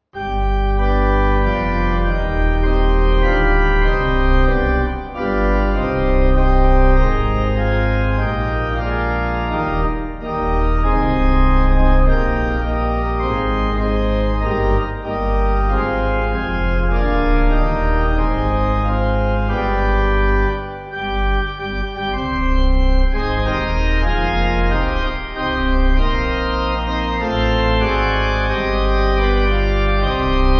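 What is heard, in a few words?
Pipe, Pipe Organ; We saw Thee not when Thou didst come; Credo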